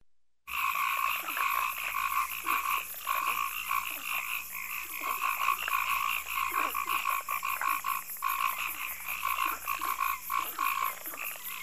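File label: zvuk nochi pevchie cikadi lyagushki i utki, sound of the night, singing cicadas, frogs and ducks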